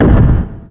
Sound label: ball drop